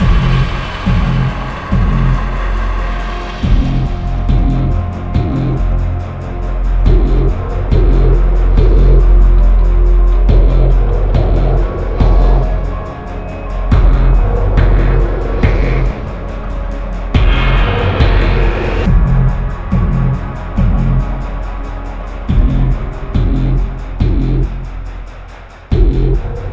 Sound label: Suspense; Prototype